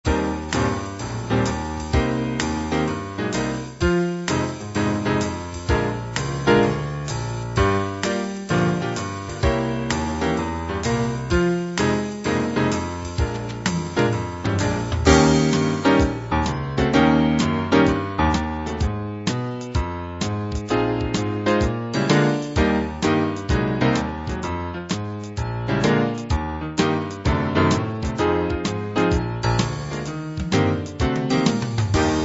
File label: piano jazz